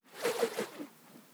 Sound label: Twirl Smol, organic wooshes; Micro Pack